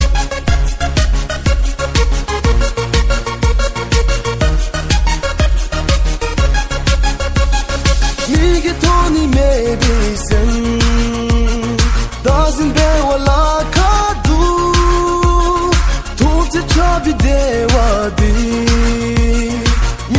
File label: cool bhutanese ringtone